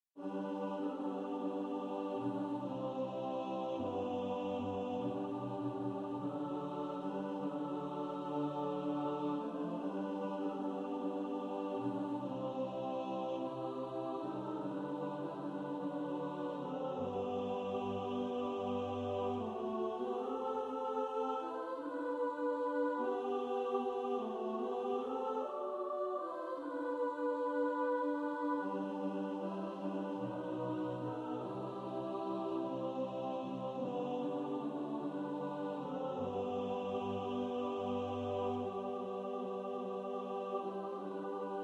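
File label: alto; Only a beam of sunshine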